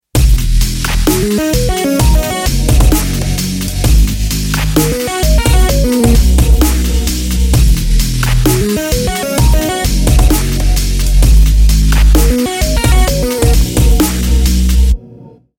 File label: Bass